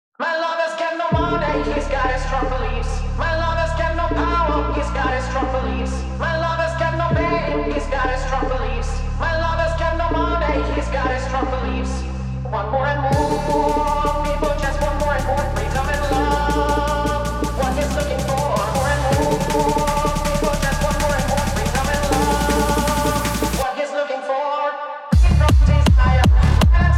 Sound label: Techno Version